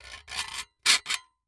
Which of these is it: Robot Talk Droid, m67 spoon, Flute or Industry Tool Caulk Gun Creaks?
Industry Tool Caulk Gun Creaks